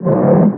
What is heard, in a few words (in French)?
Grognement